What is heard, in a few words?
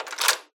door lock open